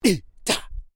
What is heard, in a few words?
Male Vocalization "Hun Cha"; Male vocal sounds; Exclamations (Sound Effects:Voice)